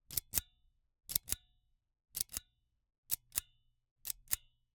Icecream Scoop, metal, mechanical, teeth, gear, squeeze, release, multiple, x5; Eiravaein Works; Vaeyan IV